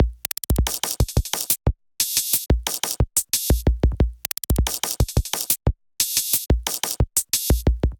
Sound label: Kit, drums; Rest Pattern; Ableton; Glitch and Wash